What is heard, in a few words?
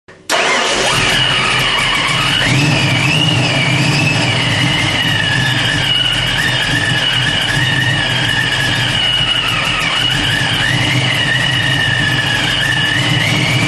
blower idle, supercharger